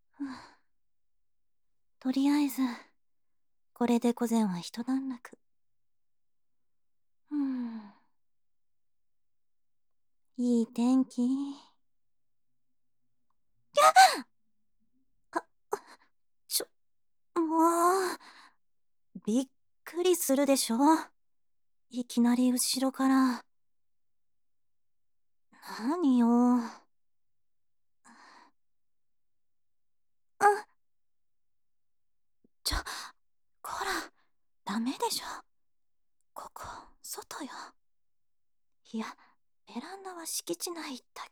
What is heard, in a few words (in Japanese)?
耳舐めASMR; 第4章 半年後の、こなれた耳舐めASMRカリ首マザコンプレイ; 効果音なし